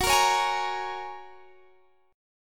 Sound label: F sharp Major Add 9th